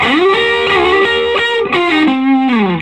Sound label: SOLO, Vintage Blues Guitar